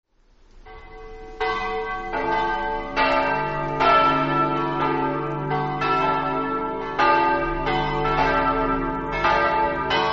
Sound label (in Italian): campane; s giovanni